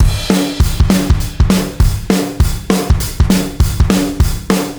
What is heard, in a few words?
Brit beat A; Ударные и барабаны (Рок, Поп); Rock & Pop